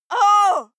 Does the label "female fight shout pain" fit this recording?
Yes